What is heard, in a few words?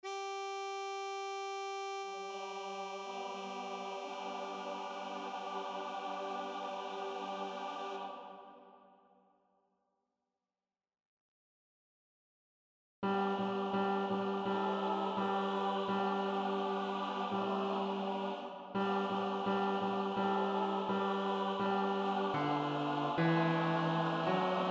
Bass; Flows from Mary had a Baby